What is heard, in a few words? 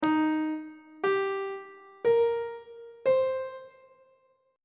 e flat major 6th chord intervals; on treble clef